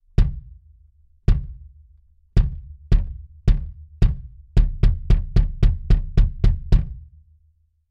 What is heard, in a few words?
bass drum dtp640 rex condenser flat; lewitt